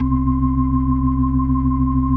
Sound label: C2, B3